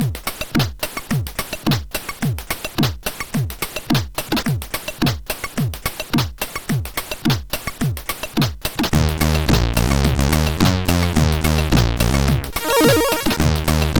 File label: Pulse Machine sid CSG8580R5; hvsc